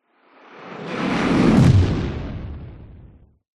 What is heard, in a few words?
sound design cinematic whoosh dark fast horror impact; zapsplat